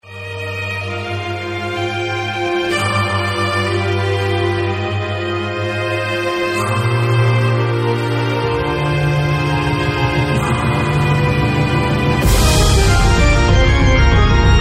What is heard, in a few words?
ORCHSTRA sample